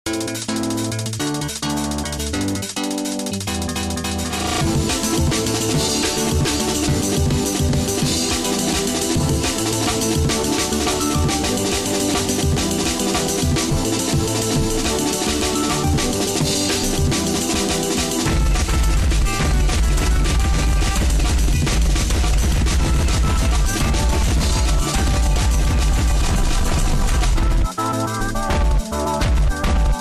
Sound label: 420bpm